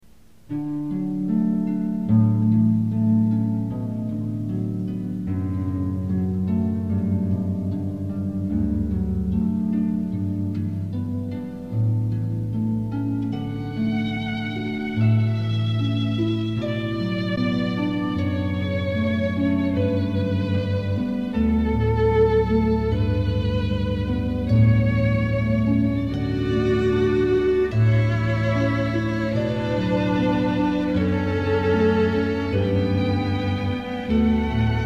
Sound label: Canon; J. Pachelbel